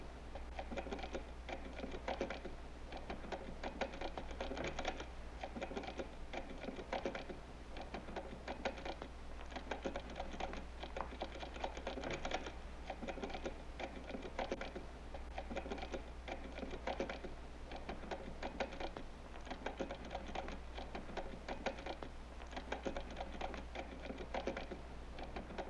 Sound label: Stenographer Typing; Home & Office; Sony Pictures Sound Effects Series Volumes 1-10; Sony Creative Software